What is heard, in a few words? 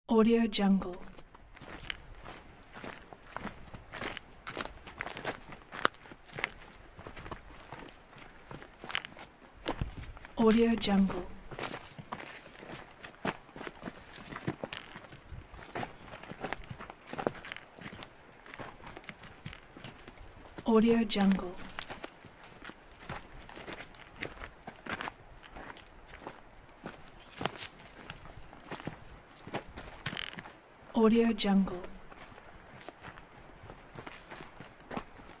sound of footsteps; valinsound